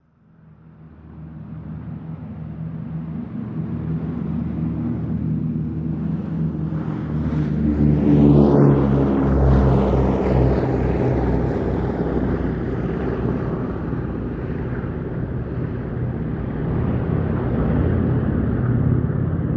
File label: B17 Pass By Faded Out, b 17 flying fortress